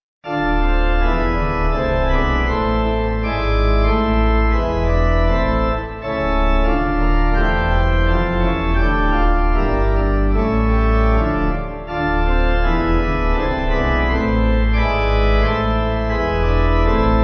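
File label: Todesbanden Pipe, Pipe Organ; Christ Jesus Lay; Bach; Bach Harmony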